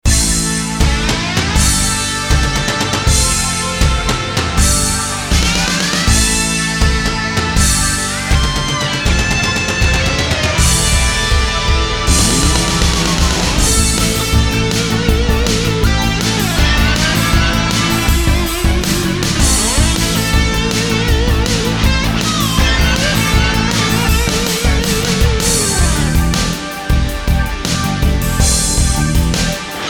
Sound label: rock